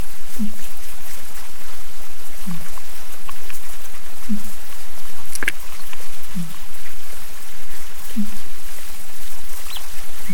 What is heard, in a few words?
fin whale